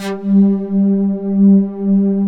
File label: SYNTH SYMPH; Pro-Rec - Synthsonic; Z1 PADS